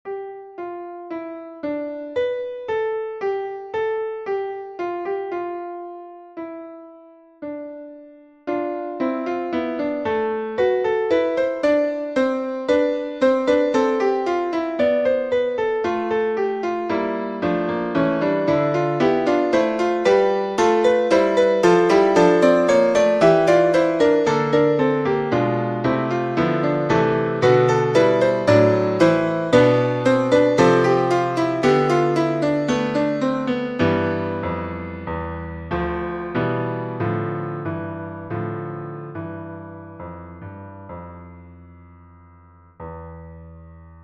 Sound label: Phrygian Fugue 12edo